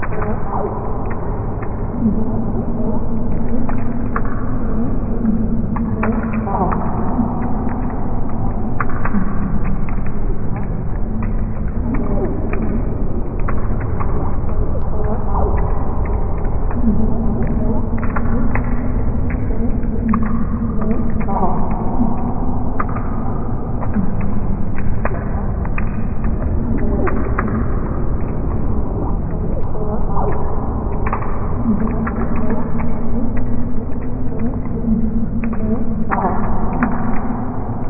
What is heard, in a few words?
greenroom loop; ambient; morass beta